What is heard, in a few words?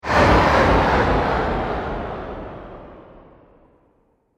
Sturdy Sweep Low Delay, whoosh